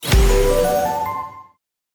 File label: success; Glitch Hero